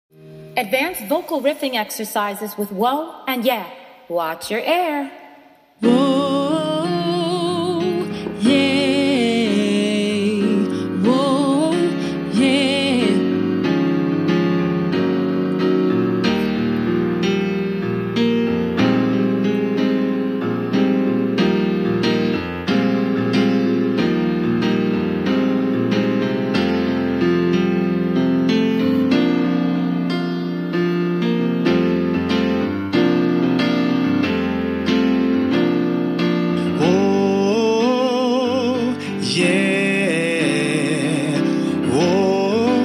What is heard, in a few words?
advanced riffing c whoa yeah